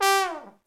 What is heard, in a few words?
THE TROMBONE